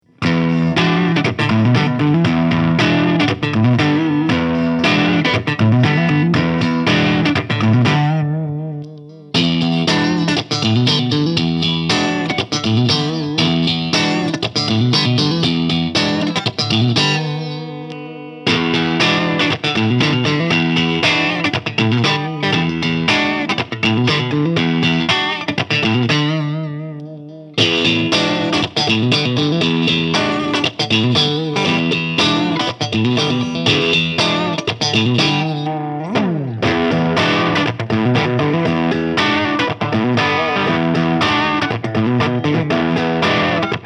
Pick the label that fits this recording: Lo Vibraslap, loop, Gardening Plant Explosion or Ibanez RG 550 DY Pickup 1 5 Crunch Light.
Ibanez RG 550 DY Pickup 1 5 Crunch Light